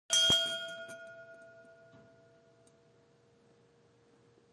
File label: ding